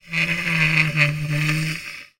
foley clothes hanger slide scrape slowly on metal rail external freestanding